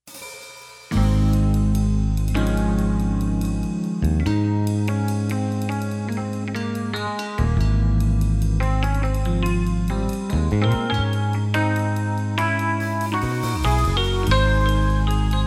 No Guitar Solo Key Of Ab

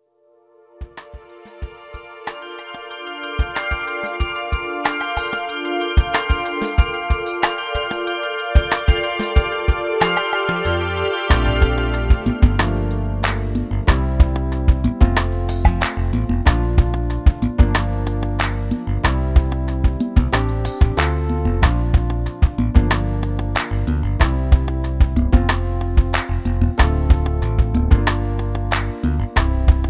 No Guita No Sax Key Of F

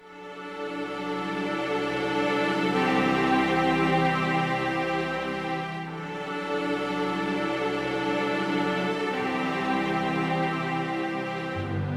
With Piano Cue Key Of G

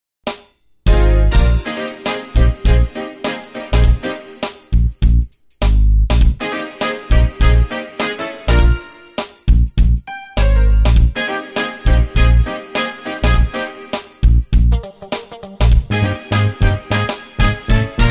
no vx Bb, backing tracks

